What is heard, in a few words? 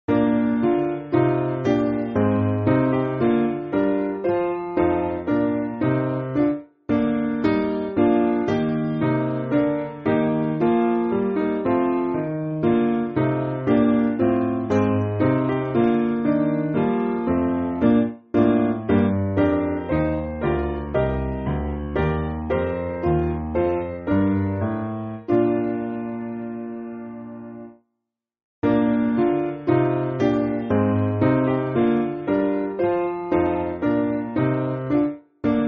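Piano, Music Backing Files for Churches; Filled With The Spirits; Chilswell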